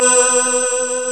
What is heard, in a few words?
GLASS B3, PPG, analog; USB Soundscan vol.49 - Analog Fantasies; AKAI